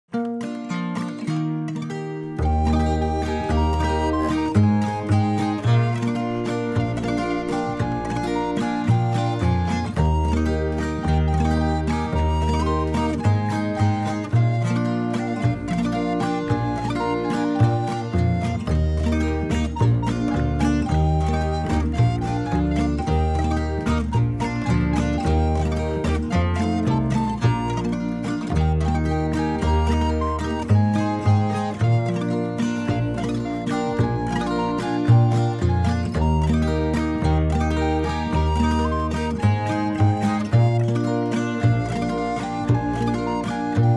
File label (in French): version instrumentale